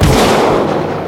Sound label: shot; hl2dm